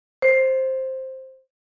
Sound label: Vibraphone single; gfxsounds